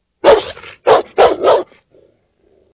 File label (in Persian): sedaye sag nar o made